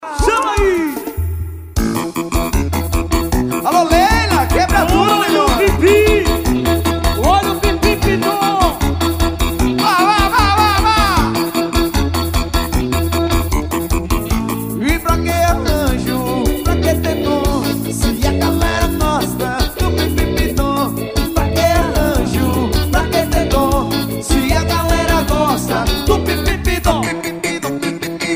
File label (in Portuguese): explosao musical ao vivo; no lata folia